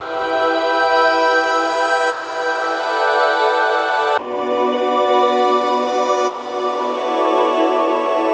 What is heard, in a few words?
115 E Synth Lone Drifter; Zero-G; Future Wave